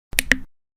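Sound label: knopka pulita, remote button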